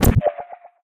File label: portal gun shoot red; Source Engine; Portal 2